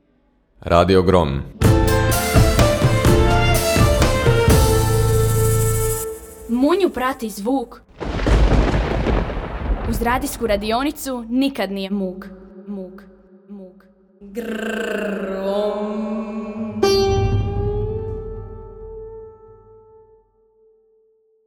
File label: Jingle RADIO; GRRROM; Škola stvaralaštva Novigradsko proljeće 2019.